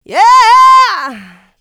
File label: vocal house adlibs; PS-28 Vocal House Samples